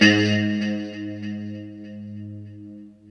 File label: LO E G#2, Guitars; Spectrasonics; Hans Zimmer Guitars VOL-1; JC-SHEEN